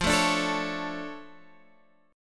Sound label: F Augmented 6th F, chord; F+6 Chord {X 8 7 7 10 9} strummed